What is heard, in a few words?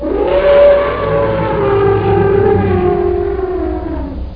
Elephant Trumpet